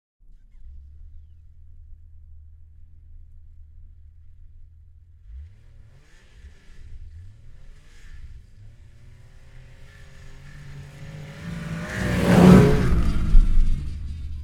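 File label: yamaha rs venture exterior fast bys; Yamaha RS Venture 2006; ORTF; Pole Position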